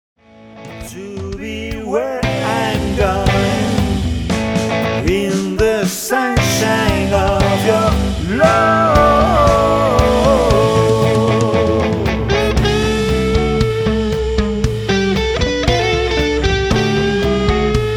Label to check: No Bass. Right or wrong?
Right